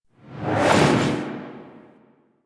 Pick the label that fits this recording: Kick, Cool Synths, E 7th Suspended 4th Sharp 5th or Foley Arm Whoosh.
Foley Arm Whoosh